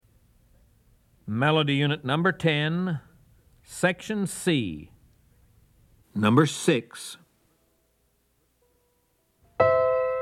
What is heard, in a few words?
melody, ear training recordings